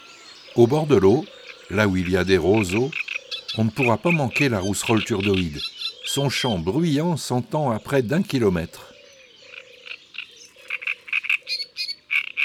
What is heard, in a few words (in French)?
Mai La Rousserolle turdoide